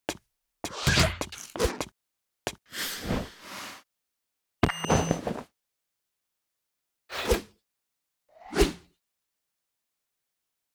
SFX; The Rickey Smiley Show; Launch

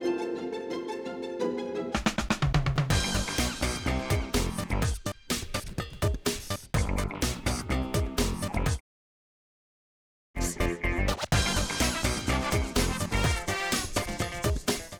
Music; WeekendWithTheFamily

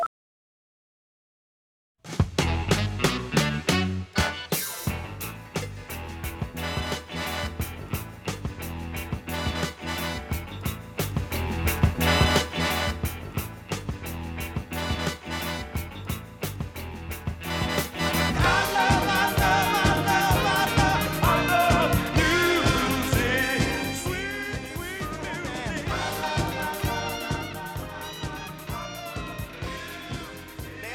Music

